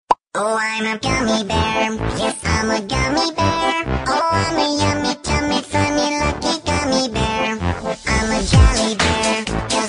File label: win